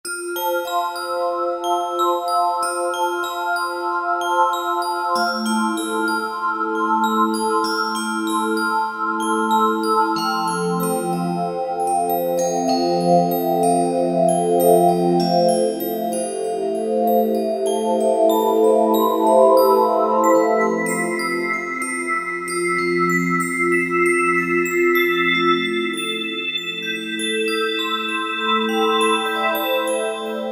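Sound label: Pad Clear Bell, Yamaha EX5R; Factory presets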